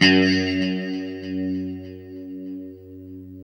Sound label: LO-E F#2A, Guitars; Spectrasonics; Hans Zimmer Guitars VOL-1; JC-SHEEN